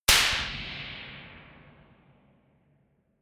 gun slapping pop gun; schusswaffen

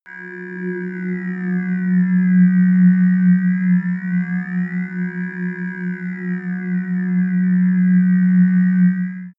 sci-fi ambient scanner medium, drones

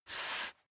step barefoot wood plank slide normal; schritte; Footsteps

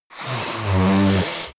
yeti nose blow strong; kreaturen

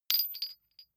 medium metal impact medium rolling

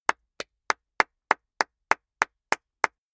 clapping medium fast; comedy-cartoon; koerpergeraeusche

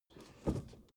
suv 1st gear release; verkehr transport; autos

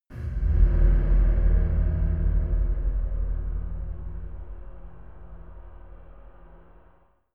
scary metal chime

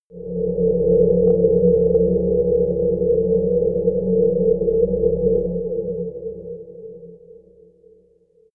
sci-fi quiet planet, drones

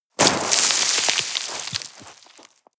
step gravel slip down debris; schritte; menschen

